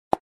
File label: Covering a camera lens pop sound